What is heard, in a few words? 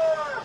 pump stop; rigs of rods